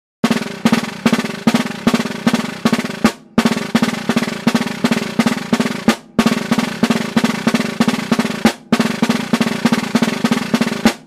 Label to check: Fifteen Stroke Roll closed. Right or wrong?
Right